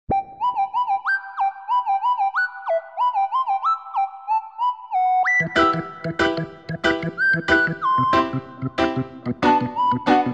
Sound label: reggae ringtone